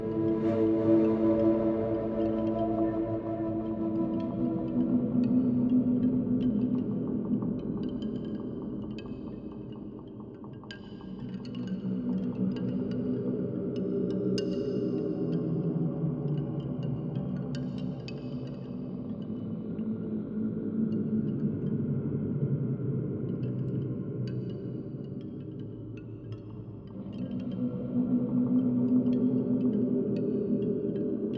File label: Load Loop; Valley